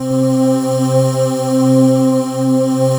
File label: CHOR, Synthesizers